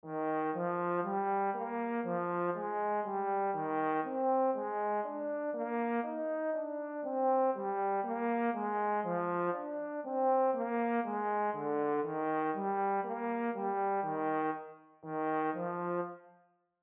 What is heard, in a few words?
Tenor Clef Example